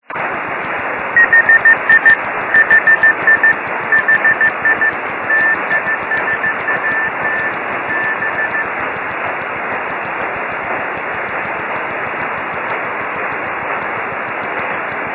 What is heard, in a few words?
HI HI HI TISAT B; tisat-1